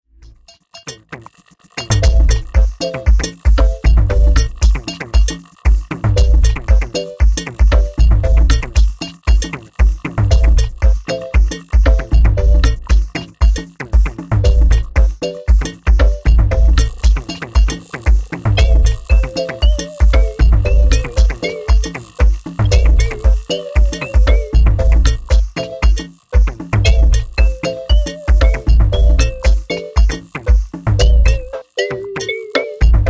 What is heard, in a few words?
Drum Machine Circle